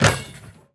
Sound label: Street Ball Dunk Chain; SFX Kit